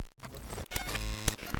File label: Purple Teleport Power On